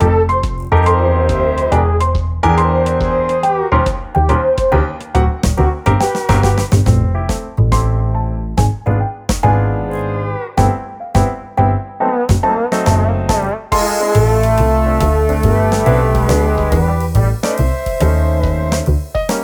BGM Fail; wicked monsters blast hd; wii u

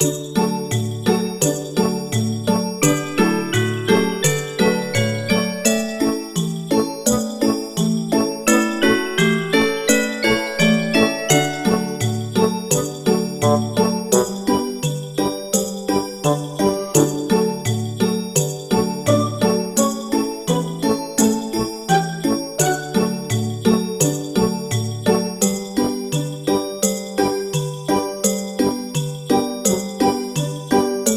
Train Ride Upbeat; peppa pig world adventures

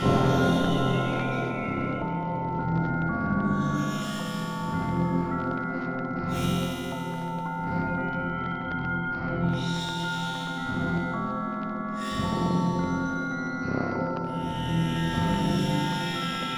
Newsreel Slowmo Loop 80bpm; jenny leclue detectiv

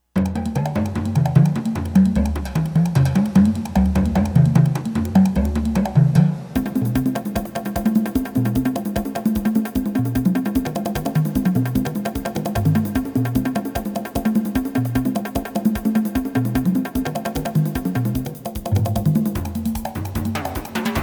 Burning Tom Tom; Brandish 3 Spirit of Balcan; IBM PC

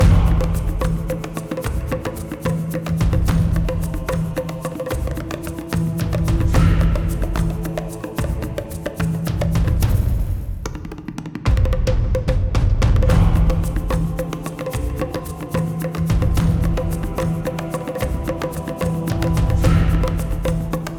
Garden Stem Test LOOP

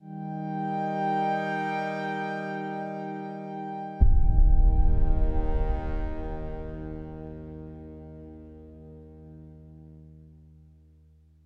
Music End Game Success

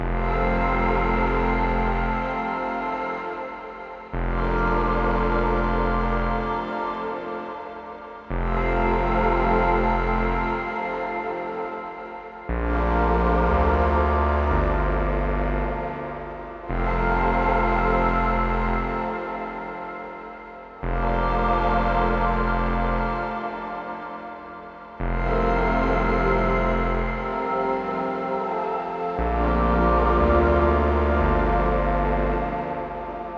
Flood Loop 115bpm; jenny leclue detectiv

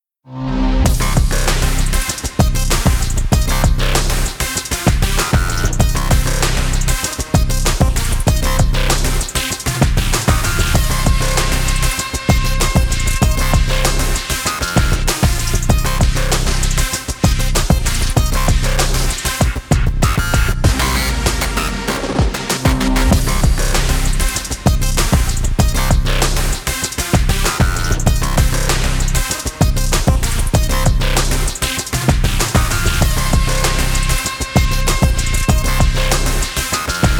Arcade Mode - Continue & Game Over; Street Fighter 6 Beta; Xbox Series X/S

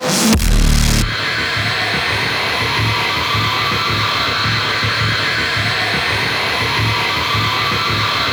cyberdemon 180bpm intro

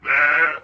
Goat Man Scream

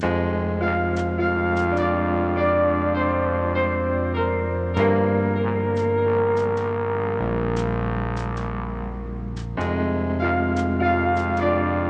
Loop 100bpm; Mozy; jenny leclue detectiv

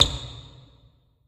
Special Sneaker Squeak; Angry Birds Seasons

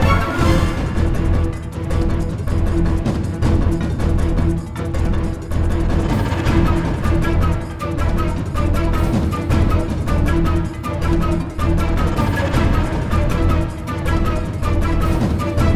Music Ingame Boss Brachiosaurus Loop; New Joe & Mac Caveman Ninja

